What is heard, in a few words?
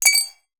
Toss Metal Adapter into Metal Glass; Sample Growing Stream 002; Ear Candy